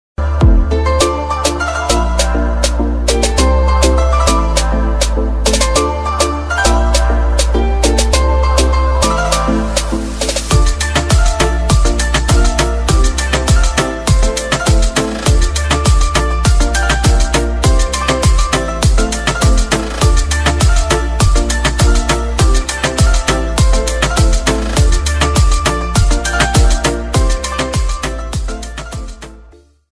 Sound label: Samsung Remix Ringtone